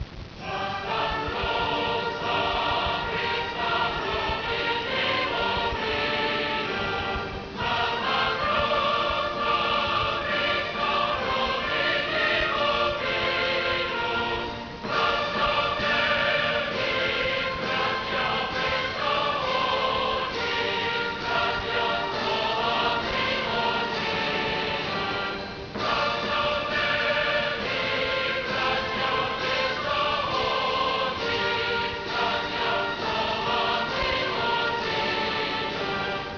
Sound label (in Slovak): hymna